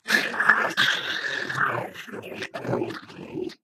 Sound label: ballbot crushed